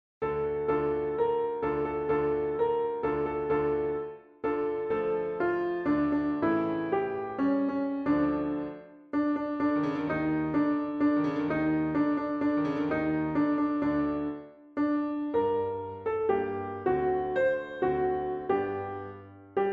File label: piano